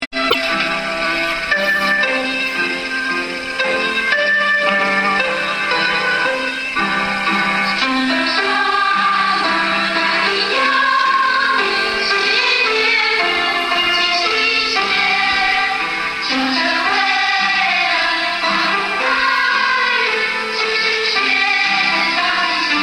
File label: schoolsong